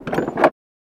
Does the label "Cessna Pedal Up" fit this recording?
Yes